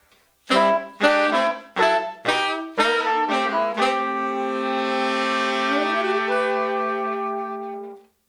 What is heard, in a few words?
Horns, 70s Analog Funk; Sonic Foundry (Sony Creative Software); What it Is!; Classic Caddy